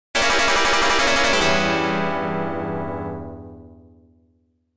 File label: Unused Jingle; U.S. Championship V'Ball; arcade